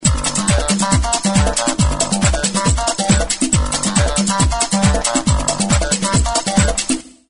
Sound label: Funky; HTC Magic; ringtones